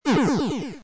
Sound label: teleport; doublespeakgames